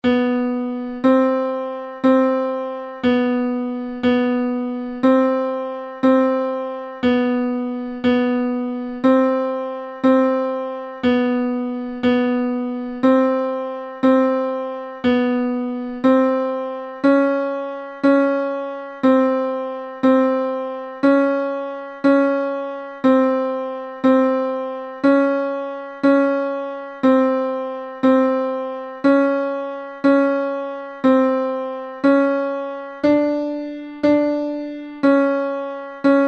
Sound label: Chromatic Trainer F, bansuri